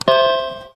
button

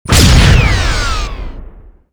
other shields hit; ships combat

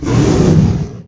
hurt; combat; creatures; dragon

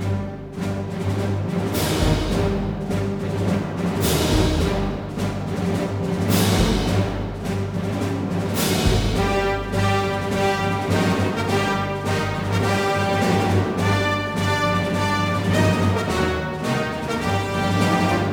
theme, combat, music

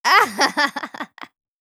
female laugh; CosmicRage

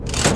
lever; ships

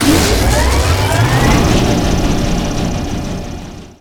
drone out; ships

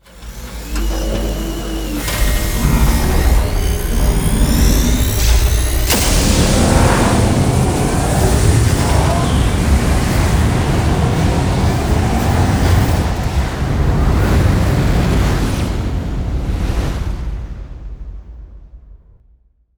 ship rift; ships misc

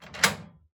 door unlock; doors

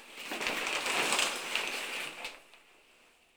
shower curtain close; Cosmic Rage; general house

